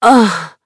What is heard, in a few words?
pain; Valorant; cstrike; agent; reyna